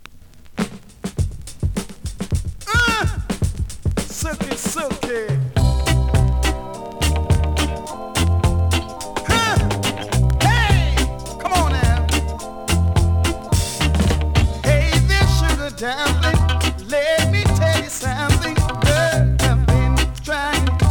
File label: GROOVE